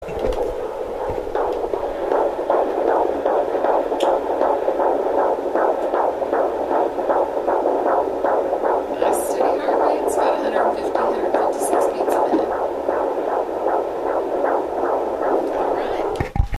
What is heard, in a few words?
baby heartbeat, Click here to hear our little spawn's heart beat.